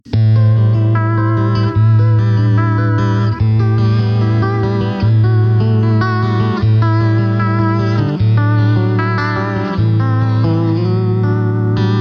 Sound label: Classic Vibrato